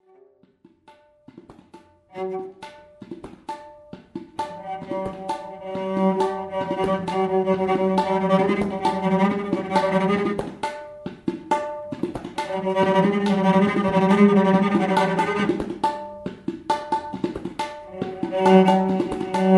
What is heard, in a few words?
nice cello